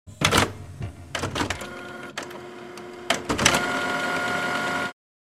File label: Home office printer preparation to print